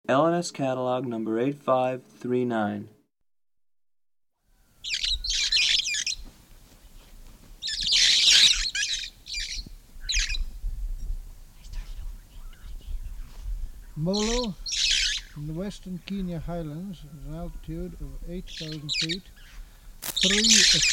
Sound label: red fronted parrot